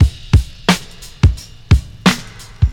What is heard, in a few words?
88 bpm drum groove c sharp key